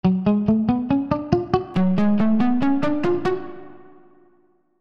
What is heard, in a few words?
Clean vs Overdrive guitar using Boss BD 2